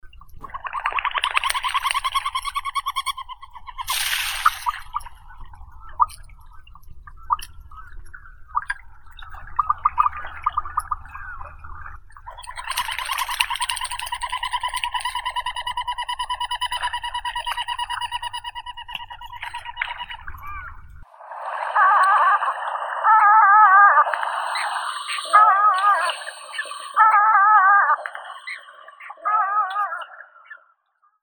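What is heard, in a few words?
surf scoter, Melanitta perspicillata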